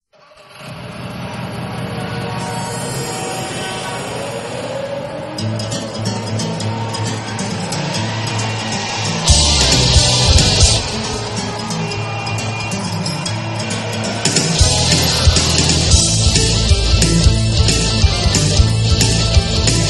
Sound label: instrumental